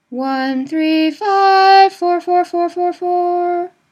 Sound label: weekday minor opening, nusach; SCALE AND MOTIFS FOR WEEKDAY MINOR